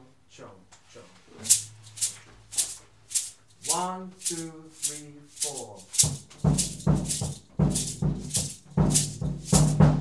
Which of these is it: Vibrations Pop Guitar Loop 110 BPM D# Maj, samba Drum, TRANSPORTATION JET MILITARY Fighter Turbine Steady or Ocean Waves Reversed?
samba Drum